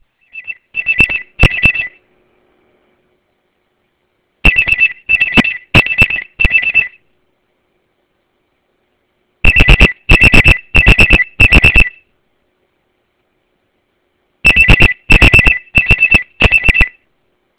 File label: benefon delta alarm; centertel; nmt